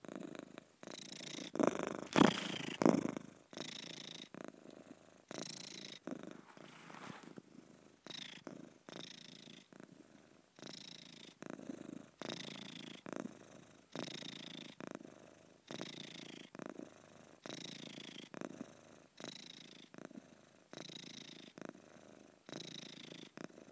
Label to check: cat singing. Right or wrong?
Right